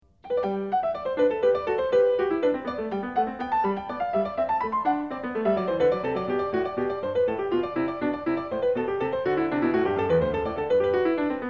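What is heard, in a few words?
courante, piano; BACH; FRENCH SUITES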